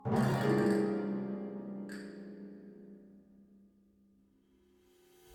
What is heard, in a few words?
Sting; lego the incredibles 2018 switch